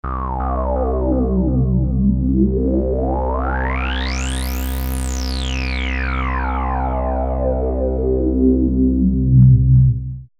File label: trance